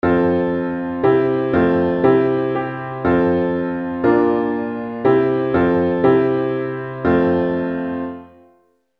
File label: harmonic melody